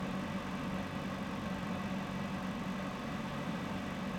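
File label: office projector fan loop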